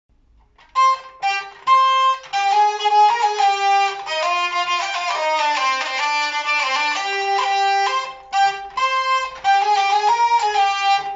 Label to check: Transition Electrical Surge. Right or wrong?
Wrong